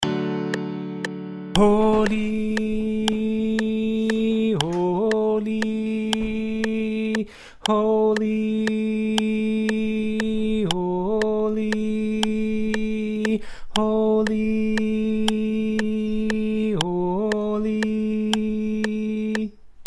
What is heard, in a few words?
soprano; music of heaven